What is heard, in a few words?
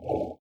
Paddle